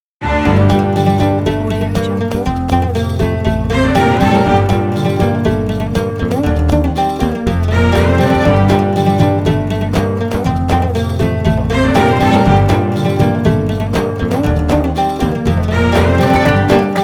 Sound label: happy oud